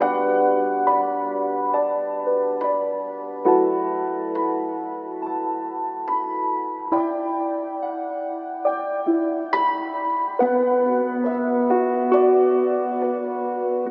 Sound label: piano, Sad